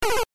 death; superbroswar

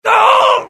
death; mohaa; dialogue

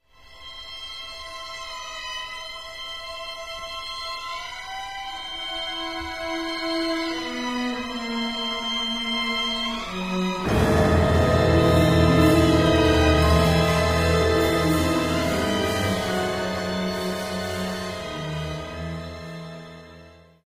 suspense, music; mohaa

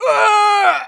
hit, pain; mohaa

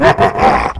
hahaha; cdogs

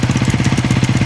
vehicle moto idle; mohaa

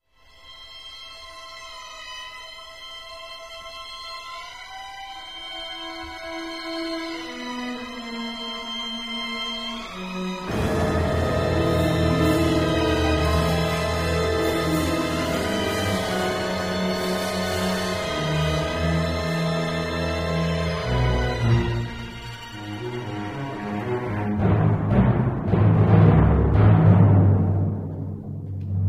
suspense, music; mohaa

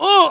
hit, pain; mohaa

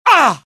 death, pain; mohaa; dialogue

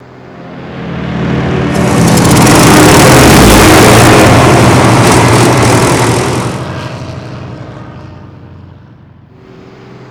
Stuka damage, vehicle; mohaa

